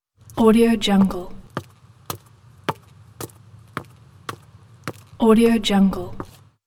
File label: footsteps walking stairs